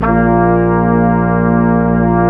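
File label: brass, Quintet; Roland L-CD702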